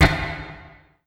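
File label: HH, hi-hat, drum kit; Sonic Foundry (Sony Creative Software); Esoterik Beatz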